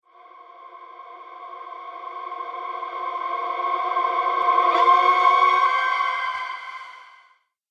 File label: Screams Ghosts; Ghost Sound Effects; horror sound effects